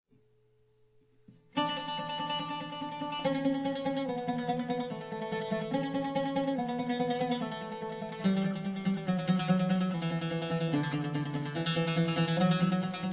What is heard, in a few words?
simple tremolo, oud